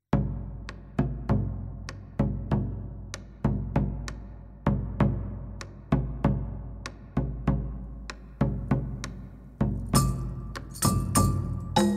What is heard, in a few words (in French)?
Percu